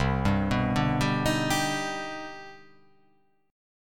C Minor Major 9th, chord

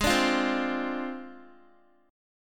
A flat 6th Flat 5th A flat Guitar, chord; strummed; x,11,12,10,12,x; JGuitar

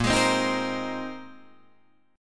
Bb 9th Suspended 4th Bb Guitar Drop D, chord

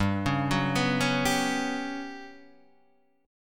G Major 7th Flat 5th, Guitar, DADGAD

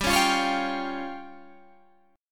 C sharp Major Flat 5th G

